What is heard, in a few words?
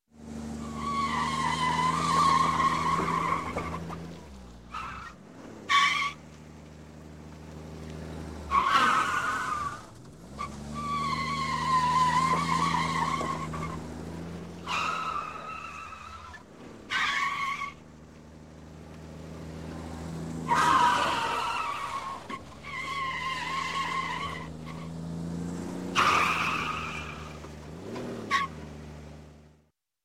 Auto, Tire Squeal Squealing Back And Forth; Skid; Sound Ideas 2000 Series